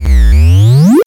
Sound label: Door; Metroid